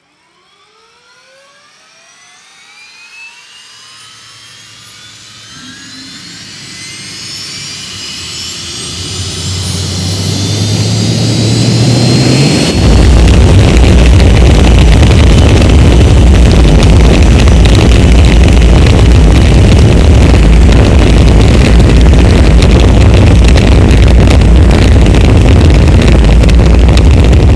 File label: rocket launch